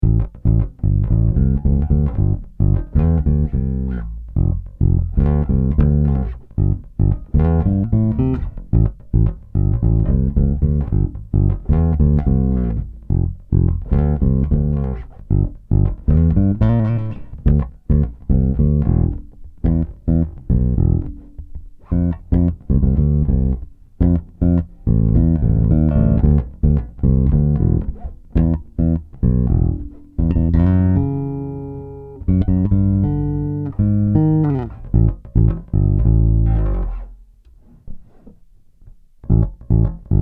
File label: Fodera Passif; basses; fodera 5 cordes; Fodera Monarch Elite Custom 5 cordes